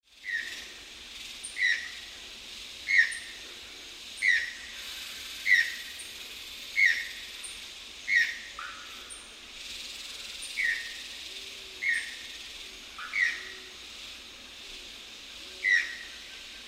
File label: Channel-billed Toucan